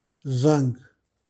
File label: bell